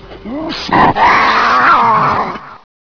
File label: ambient xenome scream